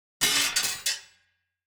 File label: sword break; player